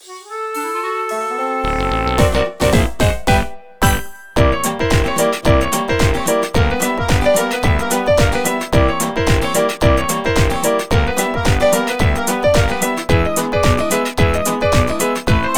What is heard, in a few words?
Background Music; Cooking Mama 5 Bon Appetit; 3DS